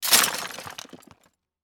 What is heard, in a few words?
ext debris hits; collanderson